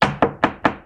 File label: Door Knock